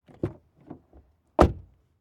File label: Car Door Open Close Exterior; Carlo Ascrizzi; Race Cars Essential Library